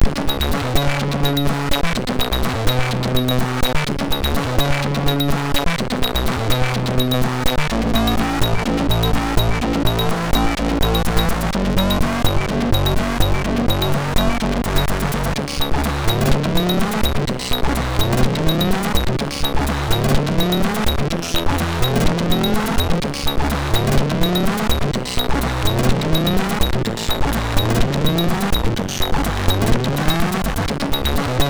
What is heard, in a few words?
Geister, MOS6581R3